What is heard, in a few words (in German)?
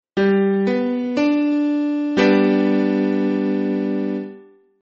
G dur, konzertina; kunstklang